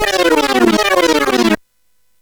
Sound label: Sweep, CSG8580R5